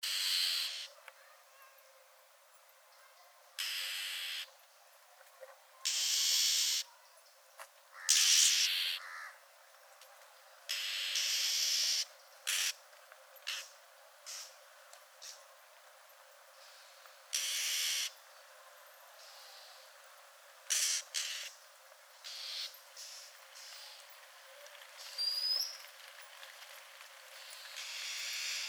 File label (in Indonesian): suara asli kacer